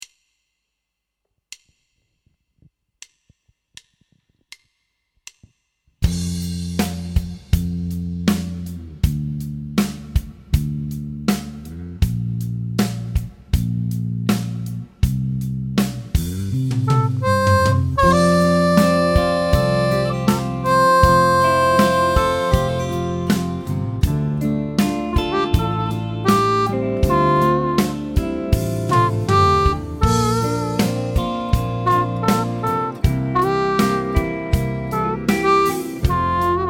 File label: F 80 harp